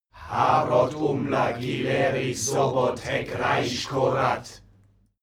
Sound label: male crowd evoke